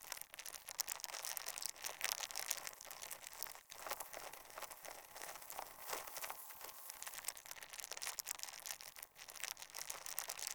Infested Eris Exterior Critter Loop

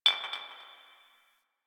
Garbage Glass Drop; Warframe; Ambience; Nineteen Ninety Nine

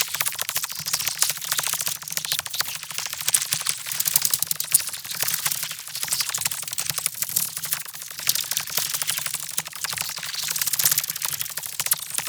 Brood Spider Movement Loop; Warframe; Oraxia

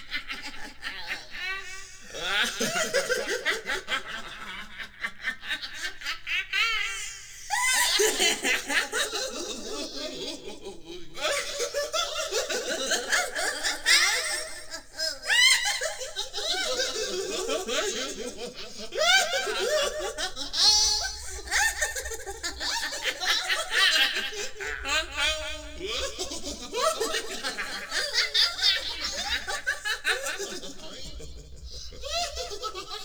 Follie Enemy Laugh Loop; Warframe

